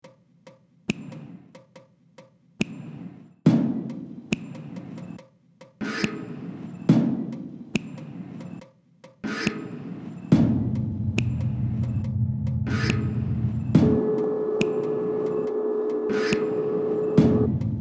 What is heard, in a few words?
found percussion